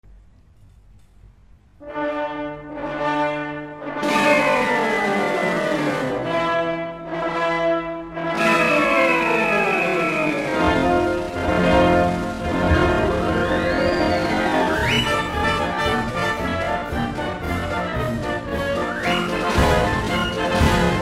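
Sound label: Circus March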